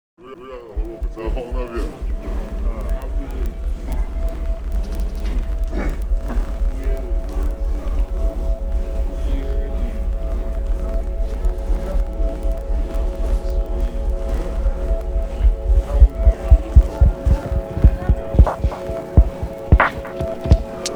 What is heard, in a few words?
Low Noise Bagan bowl